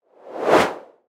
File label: whoosh air steady smooth; sonniss gdc